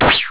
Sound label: air